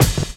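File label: drum chop; AMG Gota Yashiki Groove Activator; GOTA GROOVES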